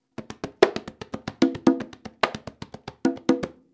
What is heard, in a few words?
rumba swing, congas